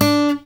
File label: pop, Guitar; 90 s Sample CDs; Quantum Leap - Guitar & Bass